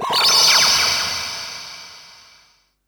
blinger, fx & sweeps; EZRollers

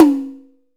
TOM, Drum Dry; East Collexion; Drum 1

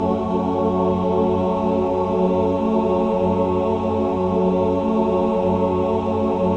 min chord, Symphony of Voices

